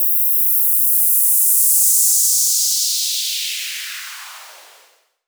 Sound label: FX Downlifter